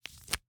Slap bracelet, smack, slap, whip, felt, plastic, unravel, slip; Eiravaein Works; Vaeyan IV